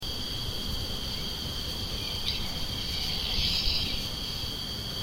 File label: Horror Night Ambience